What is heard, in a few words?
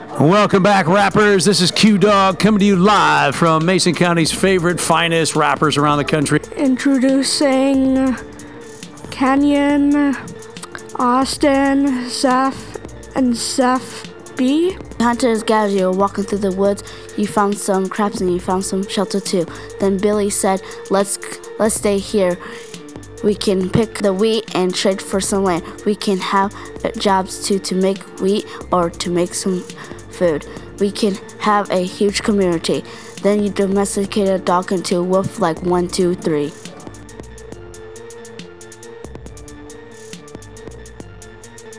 rap; jaws team